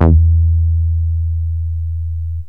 deep bass, yamaha rm1x synth

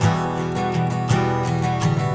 Acoustic Guitar; Guitars

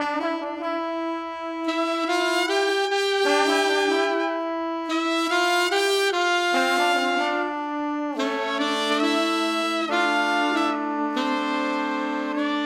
Horns Section

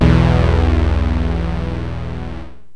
5th saw, yamaha rm1x synth

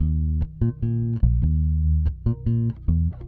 Electric Bass; Basses

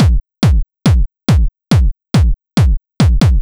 Kick Loop, Drums, Dance Beats

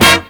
STAB; SoundFactory

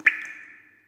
waterdrop; theGoonies; Retro

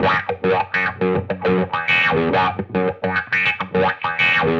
Electric Guitar, Loops; Guitars

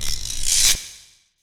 Acoustic Percussion

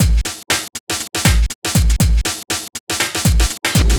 Old School Break, Drums

